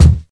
loud kick sample d sharp key, steel kick drum sample tuned to the D# note